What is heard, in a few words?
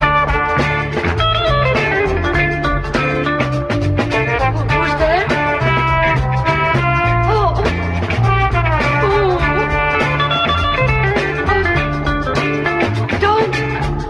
horns and guitar, Loops, Phonky